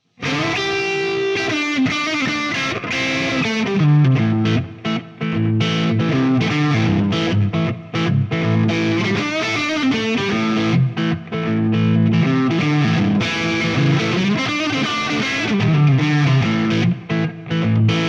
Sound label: CRUNCH; ik multimedia tonex pedal